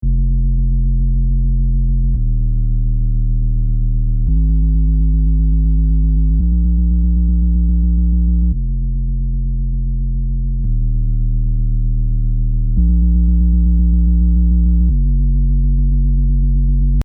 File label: bass